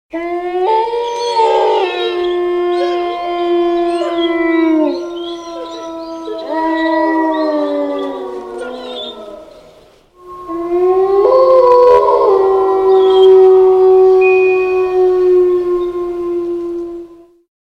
wolves howl

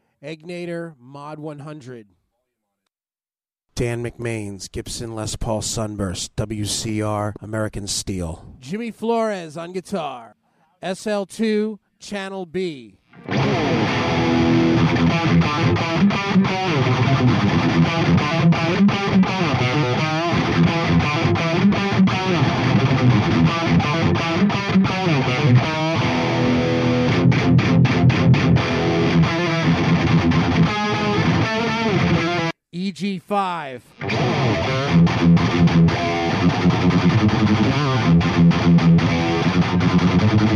egnater mod 100 bridge

